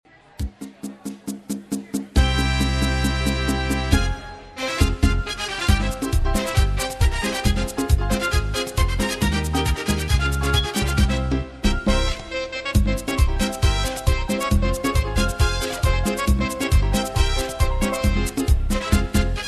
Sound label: Guengue Merengue, steel drum band; Genge Merengue